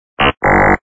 sound wrong; Monster Arena

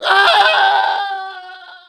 Male Fall Scream

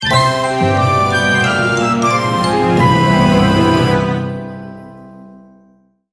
Quest Complete; Cat Quest II

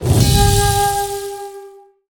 Arena Boss Beaten; Pharaonic Deluxe; playstation 4

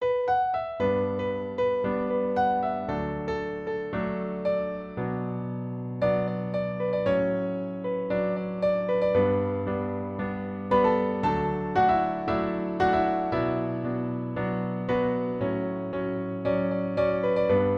Shower; Piano app by Yokee

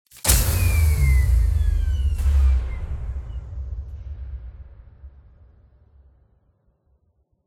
power short circut

